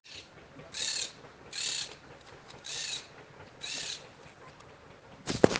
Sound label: Great Crested Grebe, Podiceps cristatus; Grèbe huppé; Aves; iSoundNat; inaturalist